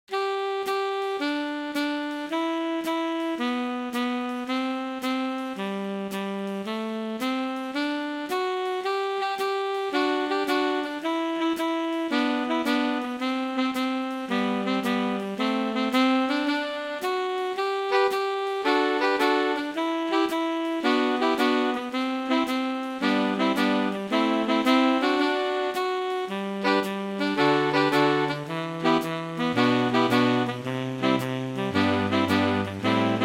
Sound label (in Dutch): Pachelbel swingend